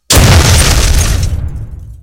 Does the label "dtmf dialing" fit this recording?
No